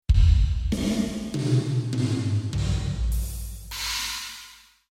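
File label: Drum hall; Konzerthalle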